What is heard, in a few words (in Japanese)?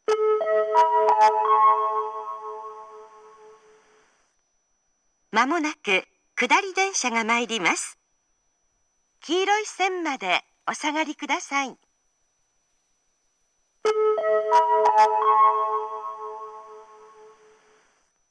kudari sekkin; atsugi